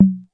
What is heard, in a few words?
KR55 CNGA; drum machines